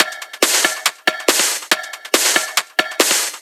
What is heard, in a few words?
Break Beats, 140 BPM